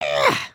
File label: generic build